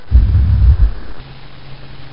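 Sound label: Heartbeat, game music; Doki Doki Literature Club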